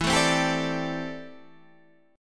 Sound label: D 7th Suspended 2nd Suspended 4th E